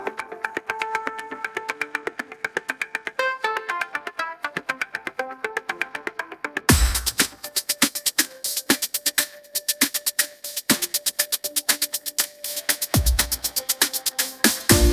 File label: upbeat pop melody, ringtones; ai